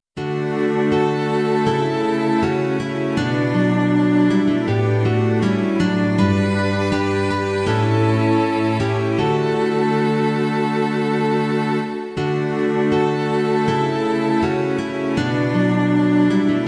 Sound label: Str Str Str Str Gtr